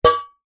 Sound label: foley tin can with lid peeled back hit lid twang